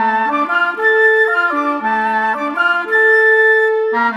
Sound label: Clarinet, Flute Oboe, Orchestral Series Rock and Pop; Sonic Foundry (Sony Creative Software); Orchestral Series VOL-4 - Rock and Pop